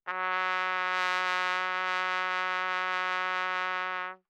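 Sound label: Trumpet vibrato ff Gb3 stereo, Bb Trumpet; MIS Pitches - 2014; Brass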